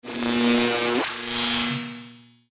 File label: flickering electricity